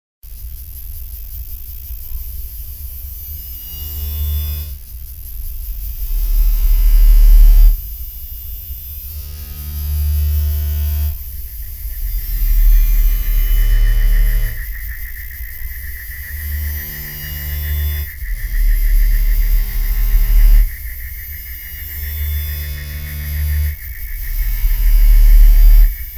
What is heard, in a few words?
Cicada highest intensity